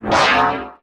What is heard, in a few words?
foley synthesized robotic sharp wobble; whoosh